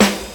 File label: Original Snare One Shot G Key, steel snare drum sound tuned to the G note